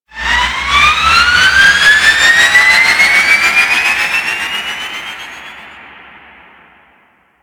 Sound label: Pursuit Spaceship Engines Back Online; Grineer; Vehicles